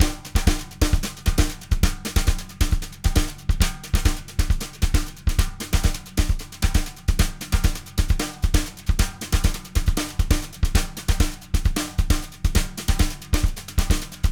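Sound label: brushes; BRUSH LOOPS; samba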